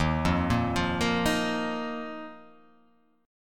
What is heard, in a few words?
D sharp Suspended 2nd